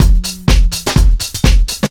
drm 125, drumloops bassworx; PS-28 Vocal House Samples

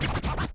Cuts, Vinyl Record Samples

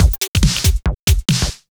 Breakbeat 140 BPM

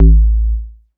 Synth Cuts; Vengeance Samples; VES1 Instruments

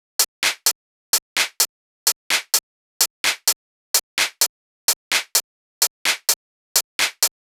128BPM Drums, Tech House; Averna; Vengeance Samples; Bonus TechHouse Kits

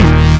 Synth Sound; Vengeance Samples; VEE Synth Shots

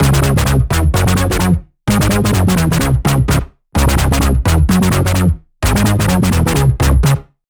128BPM Big Wobble Melody; Vengeance Samples

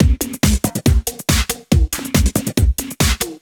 Breakbeat 140 BPM

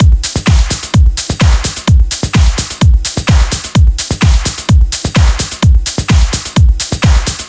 128BPM Kit; Vengeance Samples; Doubleride Kit

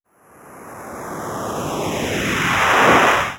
Uplifter

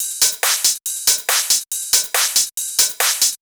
Loop 140 BPM; vengeance essential club sounds-3

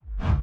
BD Gate FX, Bassdrums; Vengeance Samples

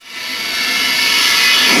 Sweeps Medium

